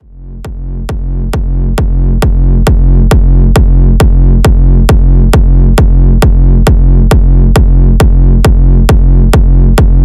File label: signature kicks; zumstein